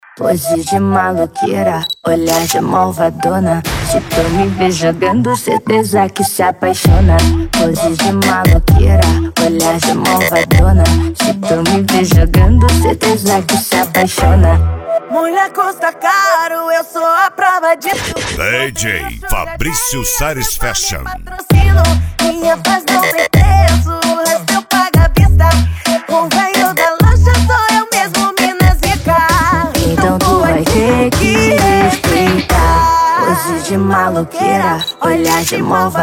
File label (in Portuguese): deep e funk antigo